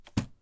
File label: Throwing; Phasmophobia